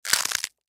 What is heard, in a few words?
Human Bite Food; Bite Food Eat Crunch Rip Tear; Eating; Blastwave